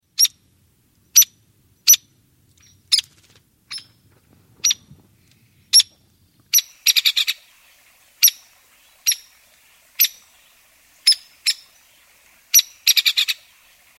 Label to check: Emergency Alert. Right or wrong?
Wrong